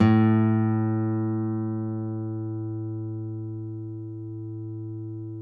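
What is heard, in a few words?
nylon, FLAMENCO, Guitars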